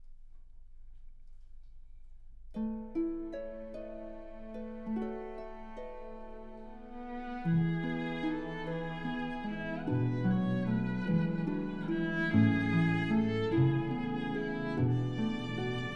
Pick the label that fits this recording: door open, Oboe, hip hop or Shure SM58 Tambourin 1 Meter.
Oboe